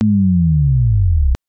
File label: defeat sting; heros